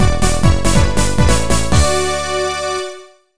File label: jingle end; Harvest Moon: Frantic Farming; NDS